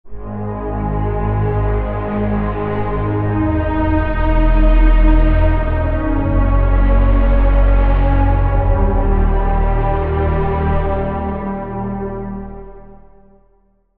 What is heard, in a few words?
pad; Soulburn; Routed Sound; Vital Expansion - Doom Carpenter Vol.1